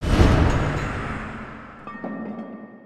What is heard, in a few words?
distant objects rattling; ninjago